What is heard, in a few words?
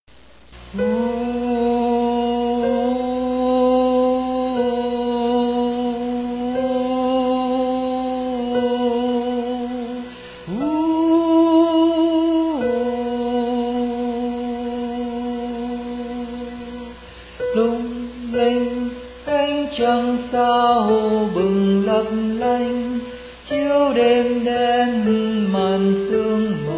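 soprano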